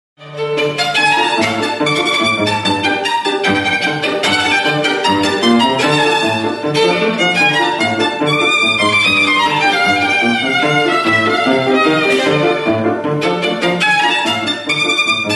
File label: Bulgar